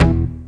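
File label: synthesizer Dance; Best Service Gigapack 1; SYN 01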